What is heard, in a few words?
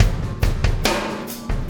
Impact Crater Beat, Processed Drumkits